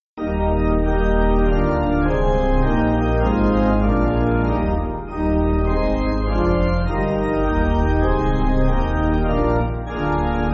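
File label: Pipe Organ, Music Backing; Am I Only Born; Venetia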